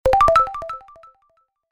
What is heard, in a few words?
login